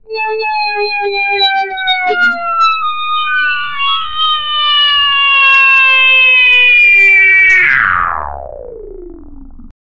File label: trombone gliss down wah w; wah-wah